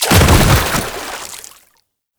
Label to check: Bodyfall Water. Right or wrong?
Right